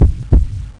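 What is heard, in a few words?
heartbeat; zoochosis panda; zoochpanda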